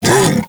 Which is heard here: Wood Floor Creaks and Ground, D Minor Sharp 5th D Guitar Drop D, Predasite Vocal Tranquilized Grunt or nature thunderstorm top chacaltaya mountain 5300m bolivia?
Predasite Vocal Tranquilized Grunt